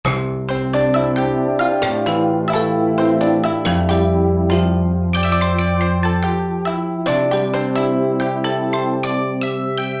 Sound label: Multi, Korg MSC-12S Synth combination; Korg MSC-12S Synth 3